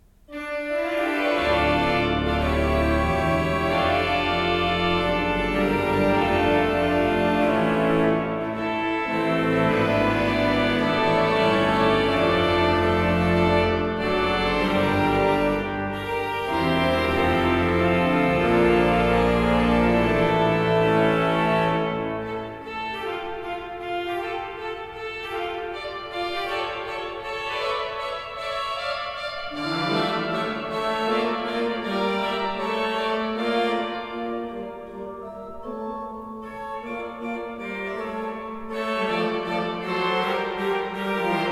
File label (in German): Breil, gebrauchtorgel